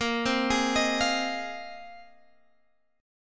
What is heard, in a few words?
Bb 7th Suspended 2nd Suspended 4th, Guitar, Open F; arpeggiated; 22,19,20,22,20,x; Bb7sus2sus4